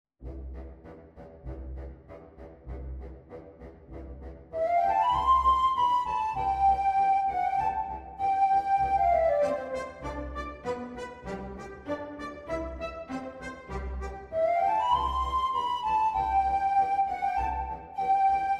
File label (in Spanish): danza china flauta pico, para flauta dulce y banda; EL CASCANUECES (Der Nussknacker) (The Nutcracker); Fernando Bonete Piqueras